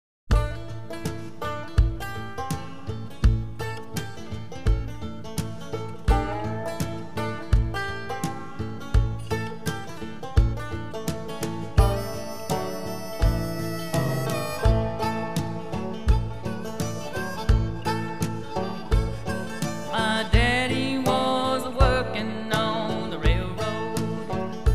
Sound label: Funky Country Livin